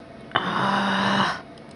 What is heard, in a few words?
augh